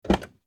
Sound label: Auto Open Door Exterior